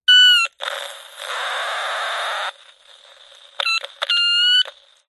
cb radio cheap static and feedback through speaker